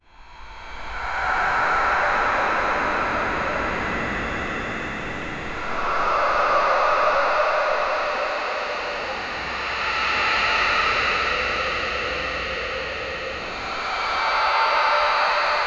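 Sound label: spooky unsettling ambience; breath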